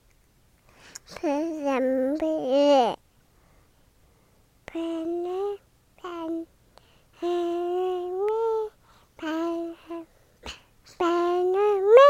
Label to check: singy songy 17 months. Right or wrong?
Right